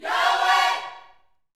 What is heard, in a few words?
Vocal, Gospel, PHRASE; Spectrasonics